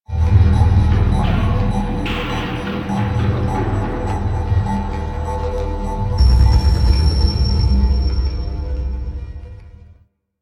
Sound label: Background Machinery A; Warframe; Ambience; Grineer; Grn Boss Room 1